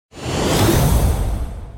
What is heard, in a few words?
Card Open Low